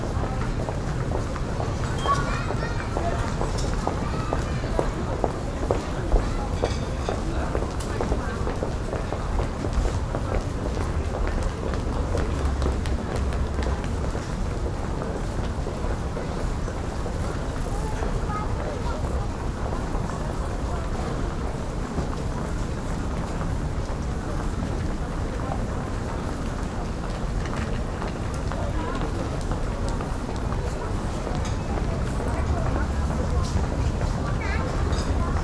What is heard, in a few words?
Urban people footsteps babble; urban and suburban; boom library